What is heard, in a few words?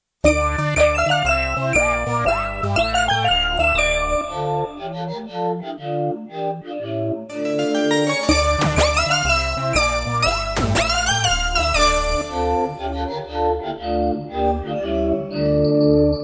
bottle tune